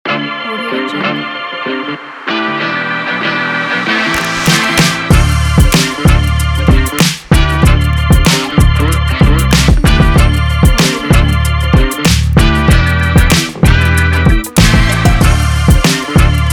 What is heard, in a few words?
this is hiphop cool